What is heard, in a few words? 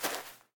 grass step; Golden Crayon Sounds